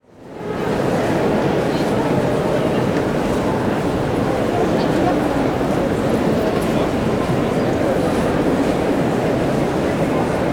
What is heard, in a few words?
Crowd Indoor Large Hall, Walla; Sound Ideas; Walla Series